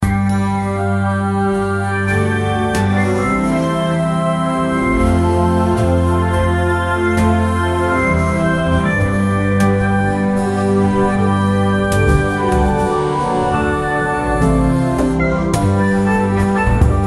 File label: sadness